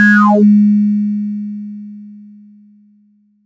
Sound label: ppg sustained acid g 3, multisampled acid synth; Jovica